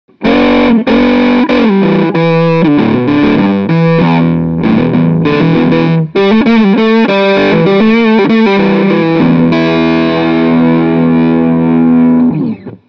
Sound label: Fat Half Drive Half